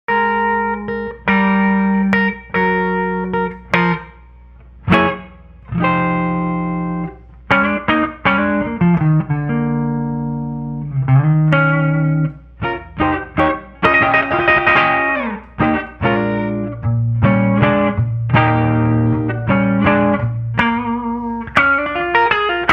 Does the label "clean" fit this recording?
Yes